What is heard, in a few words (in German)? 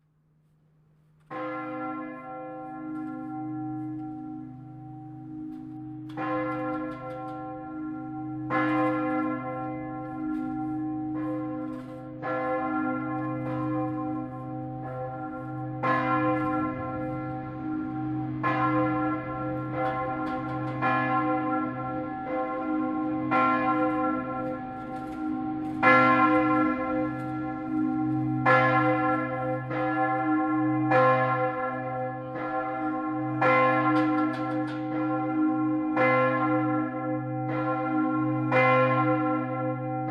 Nikolaus Glocke; Wörth